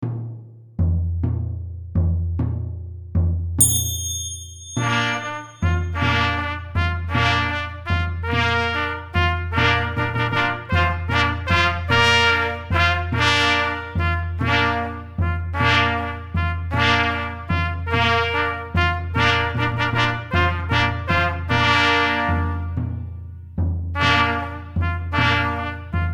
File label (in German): Aufzug der Trompeter; Mitspielmusik